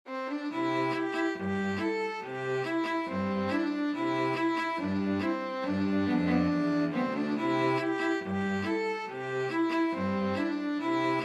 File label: string trio